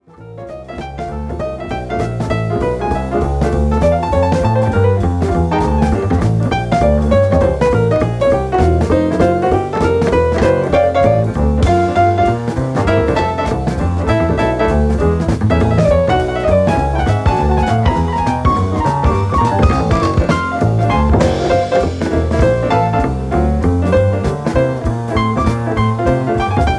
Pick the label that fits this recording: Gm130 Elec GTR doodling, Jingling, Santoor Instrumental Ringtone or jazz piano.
jazz piano